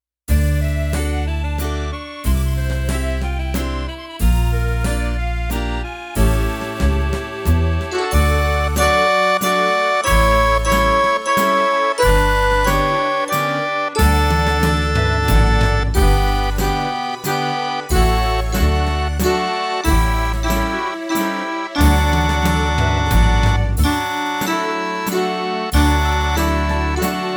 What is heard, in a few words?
VERY French Folk Song